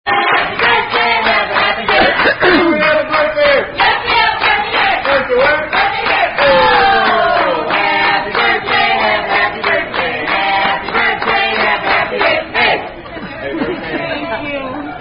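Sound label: Serenade, Happy Birthday (Tune Alouette); Red Robin Serenede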